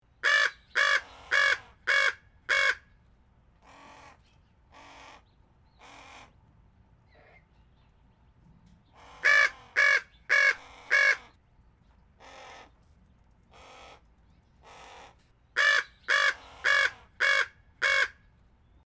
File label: chihuahuan raven